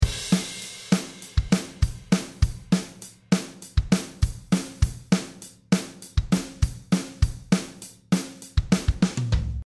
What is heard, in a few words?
drummer